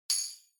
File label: Tambourine Hit Hand